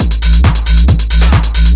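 136 bpm, deep subs loud ohh techno, drum loops and breaks; 131 to 140 bpm; samples-ghost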